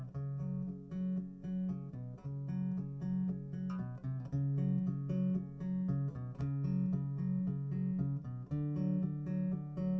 wdrums liverec; SiSEC11; underdetermined

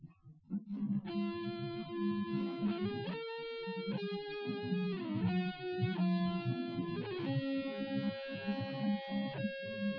nodrums inst sim; SiSEC08; SiSEC underdetermined

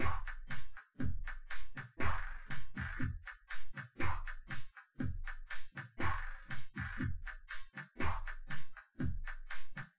wdrums synthconv 250ms 5cm; SiSEC08; SiSEC underdetermined

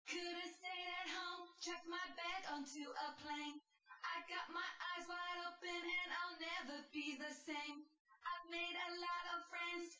wdrums synthconv 250ms 5cm

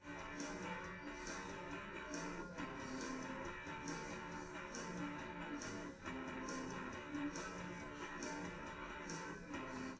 wdrums liverec; underdetermined; SiSEC11

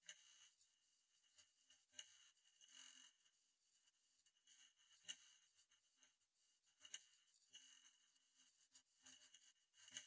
percussion

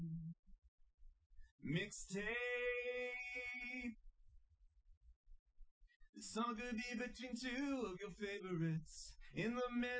wdrums liverec 250ms 5cm sim; SiSEC08; SiSEC underdetermined

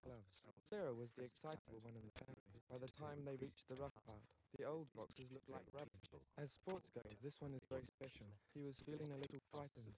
male; SiSEC11; underdetermined

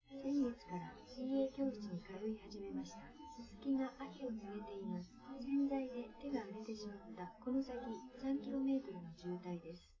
female live rec 1m sim; SASSEC07

